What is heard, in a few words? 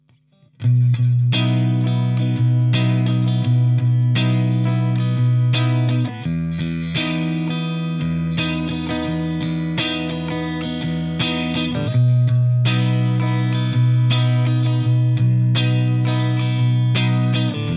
Start clean